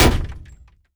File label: Hit In, Damage; DCS